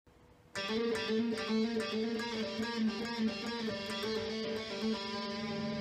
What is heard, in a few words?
Hammer Ons and Pull Offs Sample Sound Only